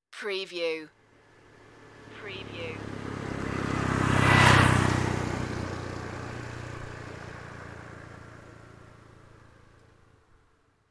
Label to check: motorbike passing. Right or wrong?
Right